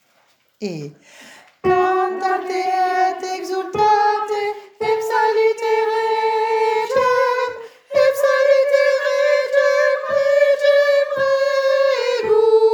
cantate domino sopranes

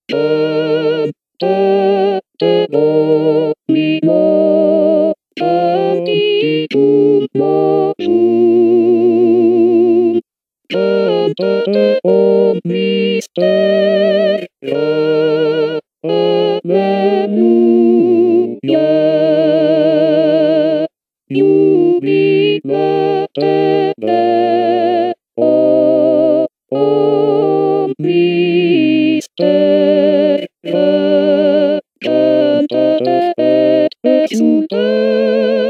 soprano cantate domino haendel

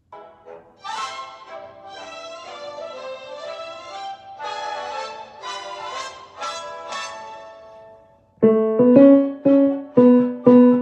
bass

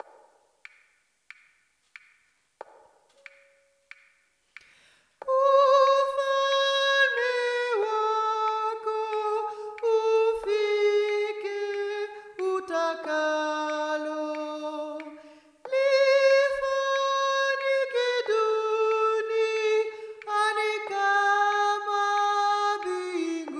mezzos

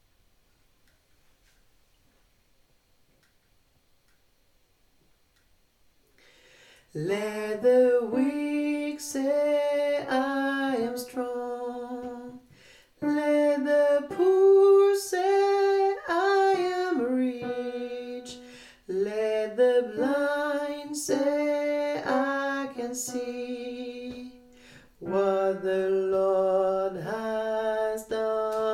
hosanna

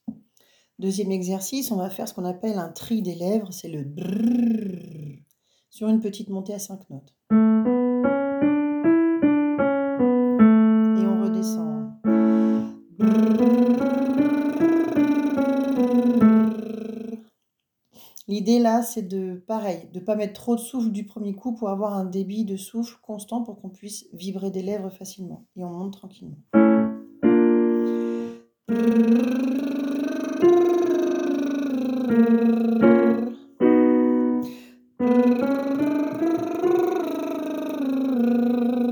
trill, technique vocale

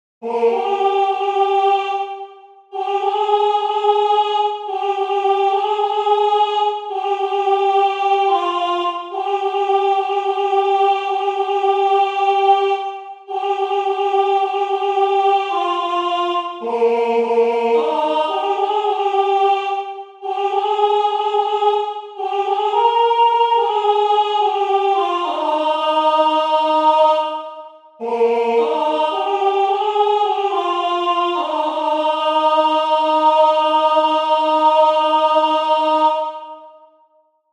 soprano

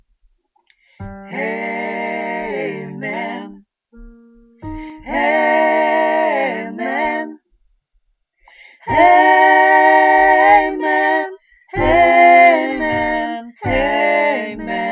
amen tutti

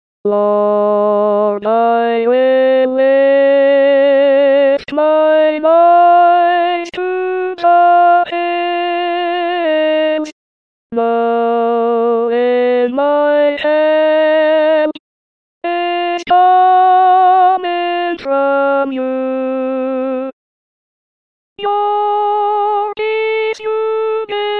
alto, gosp

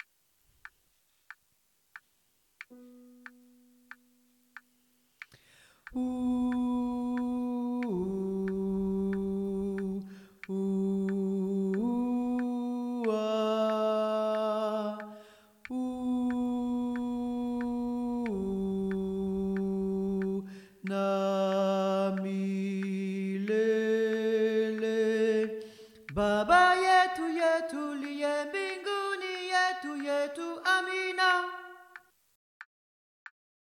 tenors

